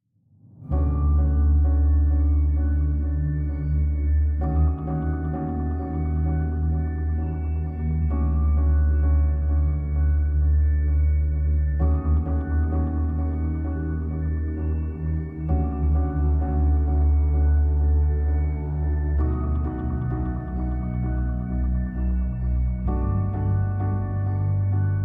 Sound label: Forest Music